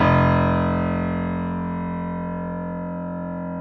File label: PF, B GRAND PF